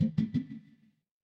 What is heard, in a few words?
ui low battery